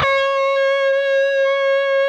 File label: PRS D, Guitars, LEAD